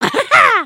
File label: camera picture; emotes